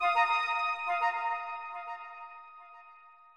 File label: Musician Rebloom Flute